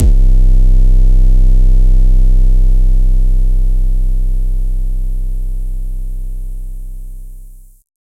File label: 808, Drums, Bass